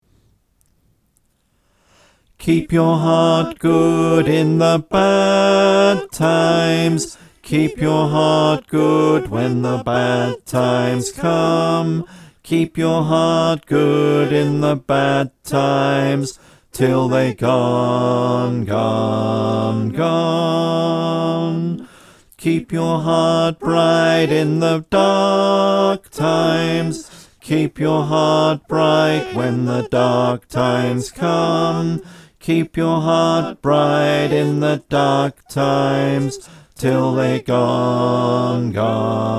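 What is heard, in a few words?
bass, choir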